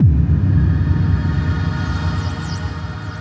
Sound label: BD-FX, Dance Mega Drums; Best Service